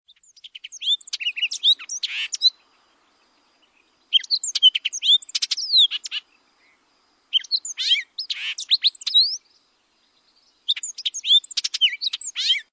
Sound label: Hooded Oriole